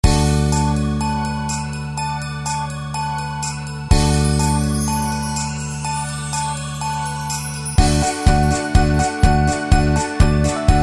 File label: Jingle Bells (instrumental)